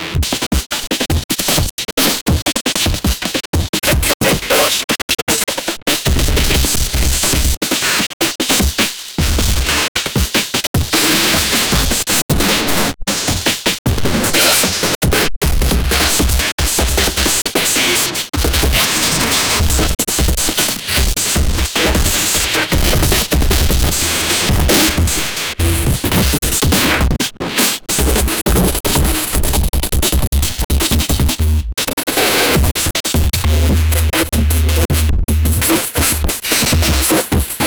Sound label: breakcore